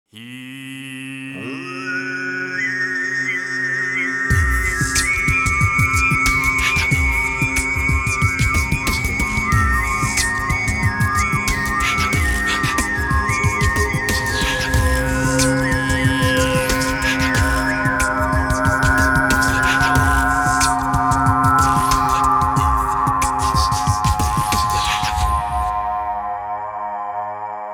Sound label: Groovy Monk